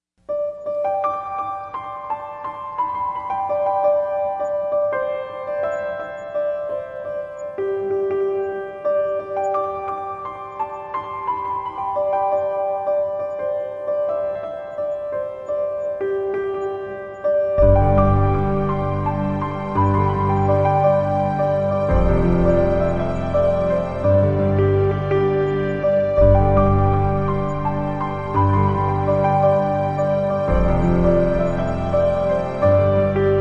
Chimes

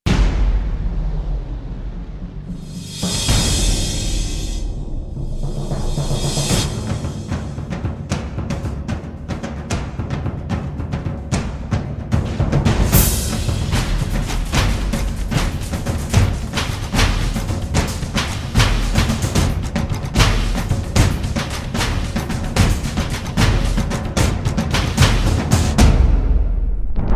Drums Only Version

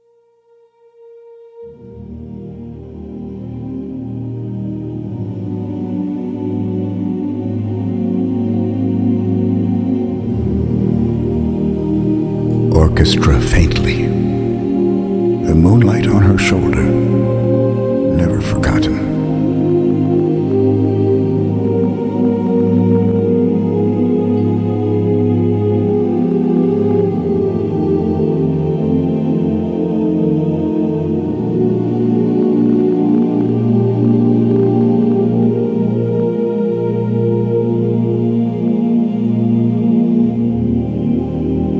Orchestra Faintly

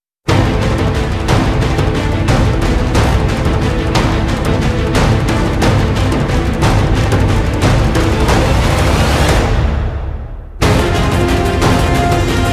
Dragon Attack, Pounding Percussion Taikos; Pounding Percussion vol. 3

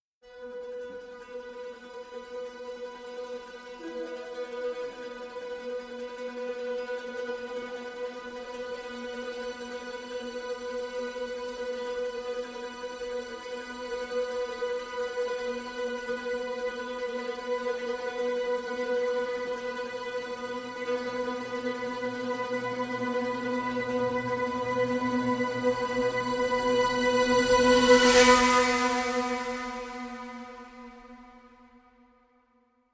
Suspended Trems (B); Brand X Music; Brand X Music Catalogue - Editor's Essentials

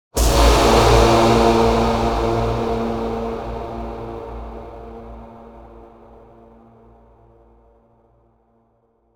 Impacts Horn; Gothic Storm Music; Advanced Sound Design

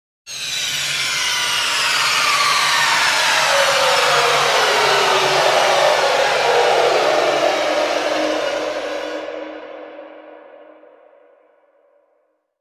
Orchestral Faller; Epic Tools vol. 1

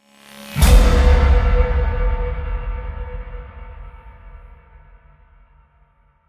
Impacts Demolition

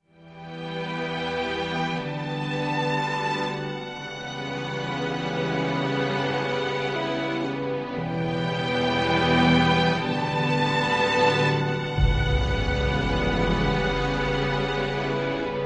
Concern Ambient

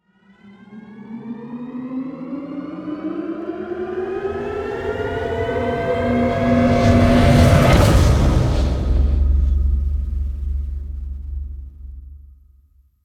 Alarming Leap; Gothic Storm Music; Brain Warp (Sound Design Trailer Tools)

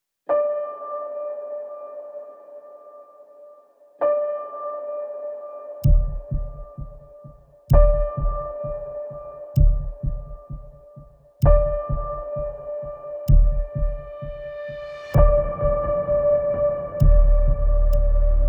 Broken Cells; Ninja Tracks

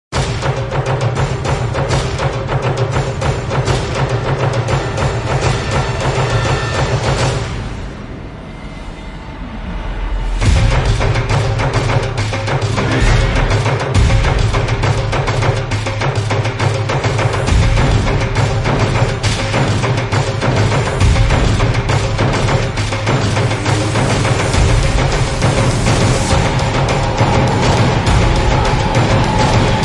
Tribal Drums from Hell

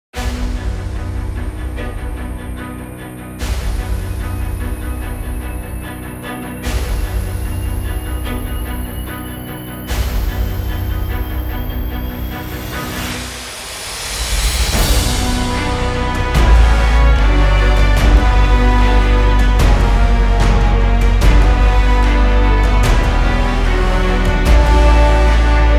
No Vocals, Epic Action & Adventure

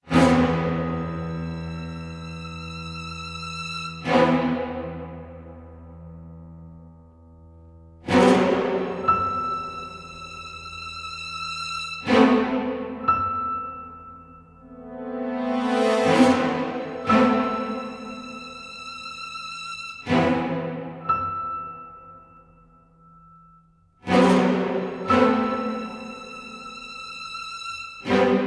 alarm cinematic

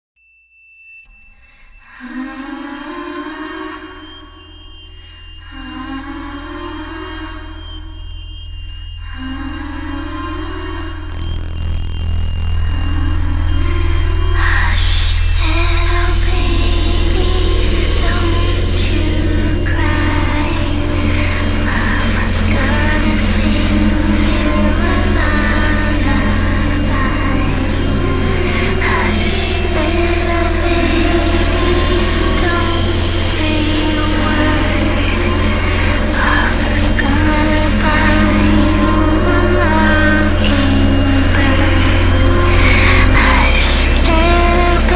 Haunted Hush Little Baby (Creepy Vocal)

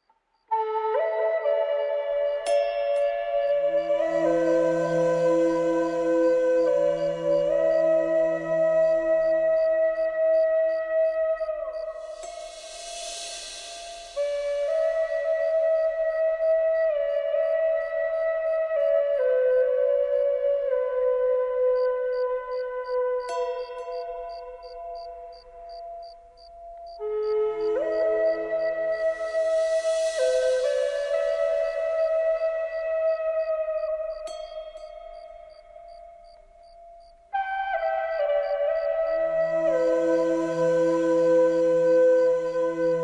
Crickets Tibetan Bells for Yoga

